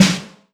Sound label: soft hit clap